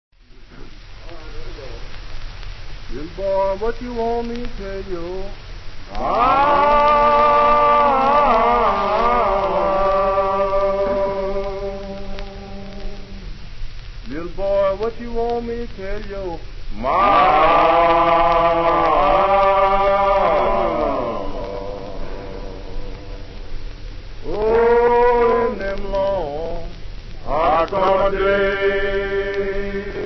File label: Prison & Field Recordings; DUCKS HOUSE